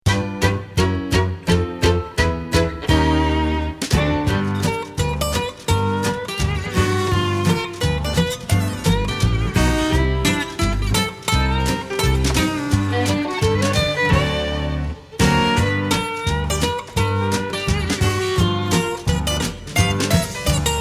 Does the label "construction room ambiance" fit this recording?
No